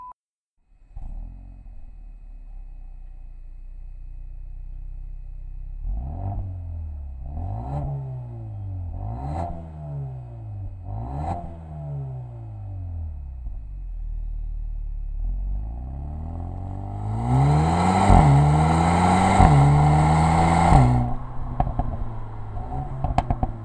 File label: Mercedes AMG A35 Serie